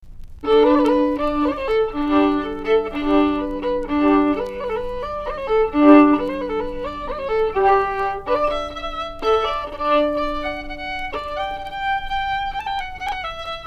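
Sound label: crooked version, Sliabh Luachra hornpipes; callaghans funny; the humours of lisheen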